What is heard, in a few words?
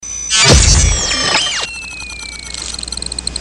bounce